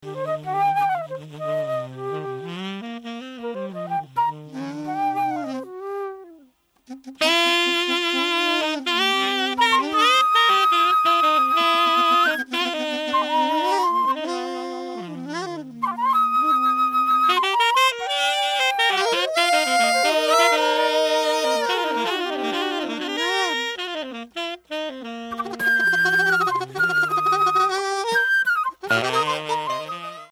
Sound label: Blues